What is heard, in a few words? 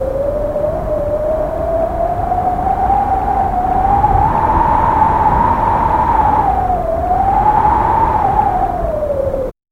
strong wind; gale